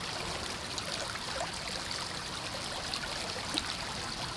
small stream on the street; ambience; NS Studios - ultra sound collection

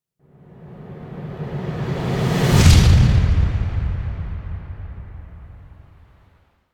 Whoosh Into Big Impact Explosion; Hollywood Edge; sound designer's toolkit v2

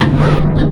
Menu Horror

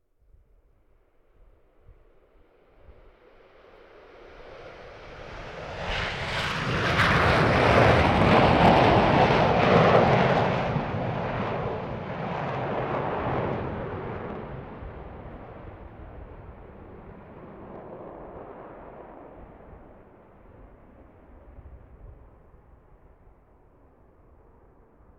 Aircraft Concorde Taking Off; KMP Film Video Sound Effects

